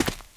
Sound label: concrete, player footsteps survivor walk